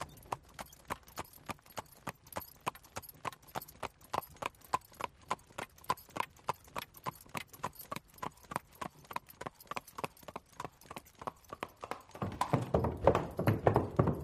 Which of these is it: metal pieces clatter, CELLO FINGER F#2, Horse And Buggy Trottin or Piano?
Horse And Buggy Trottin